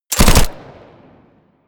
smg uzi 45 modern burst

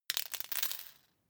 paperclip drop floor few

